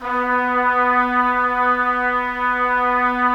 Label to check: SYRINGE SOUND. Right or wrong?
Wrong